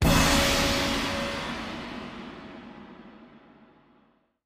free Spins Pop Up; halloweenjack

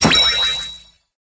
bonus wheel generic coin win; koi princess

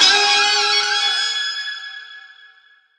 super Mega Win End 7up; hotline2

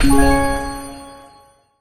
small win; silverback

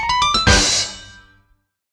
small Win; scruffy duck

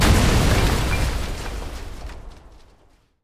sym Explosion; elements

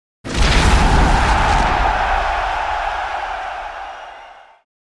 scatter Win Presentation; warlords

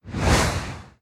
Reel is pulled backwards into the background; koi princess

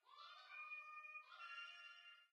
amb People Oct Up; willyshotchillies

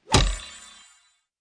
random Wild Arrows; warlords

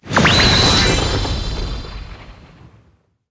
normal wild expands to expanding wilds; koi princess

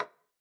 hit Soft, roulette; advanced-roulette

